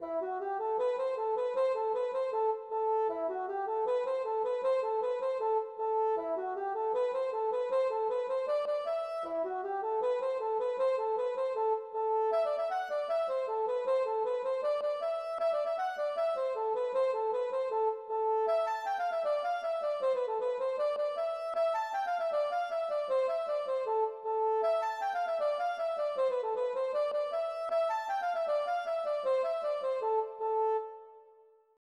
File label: gavotte; Tons Diwezhan